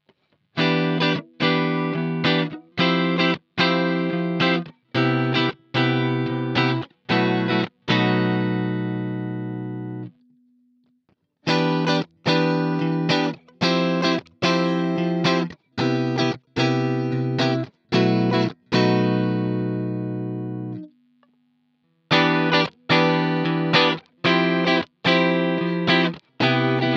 Strat Clean Rhythm

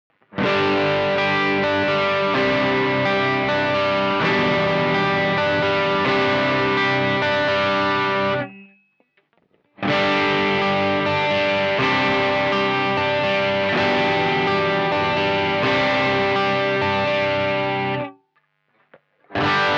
P90 Crunch Rhythm

